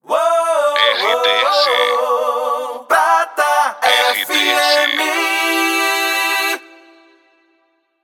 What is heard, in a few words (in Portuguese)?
acapela